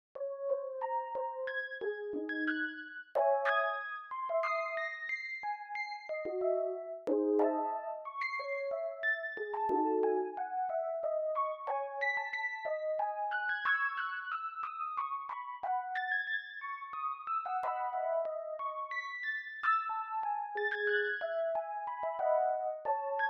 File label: midi playback; bwv849